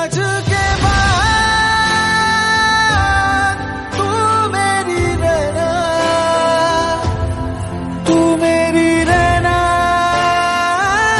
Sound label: Hindi Ringtone